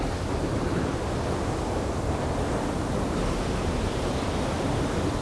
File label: howling wind; environment; Tribes2